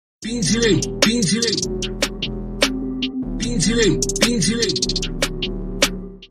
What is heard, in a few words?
rap